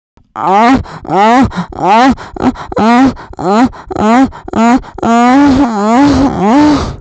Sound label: earrape